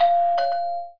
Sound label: doorbell